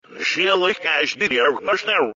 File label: retro